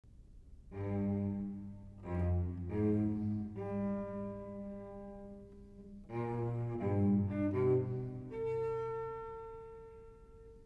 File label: cello suite no 2